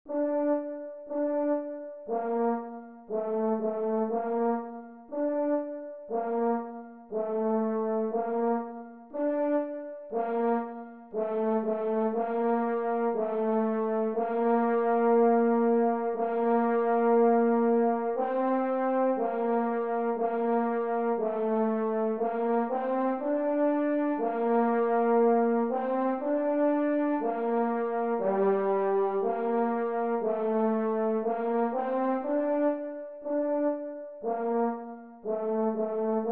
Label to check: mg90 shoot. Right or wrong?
Wrong